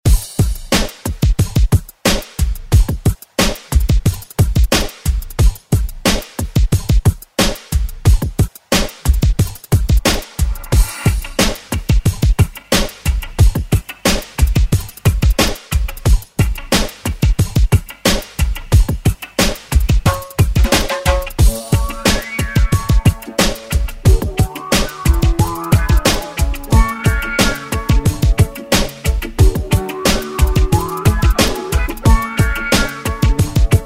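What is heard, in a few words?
Reggae Extended Redrum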